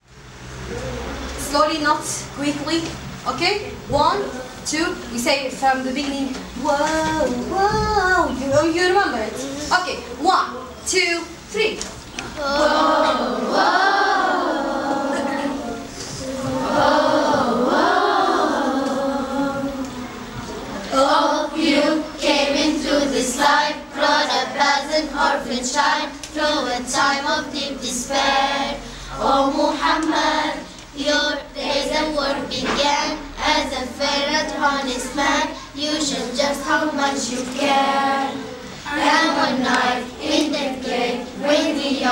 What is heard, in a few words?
School singing